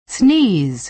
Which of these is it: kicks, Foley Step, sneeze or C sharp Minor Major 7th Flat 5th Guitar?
sneeze